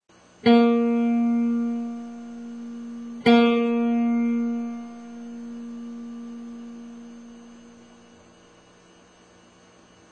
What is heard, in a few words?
acoustic tune up 2nd